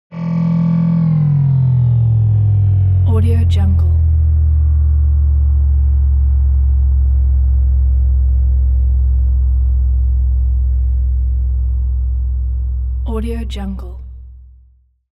sci fi electronics protective shield shut down low; smartsoundfx